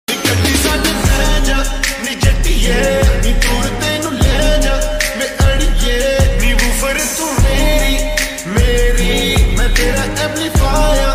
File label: lofi ringtone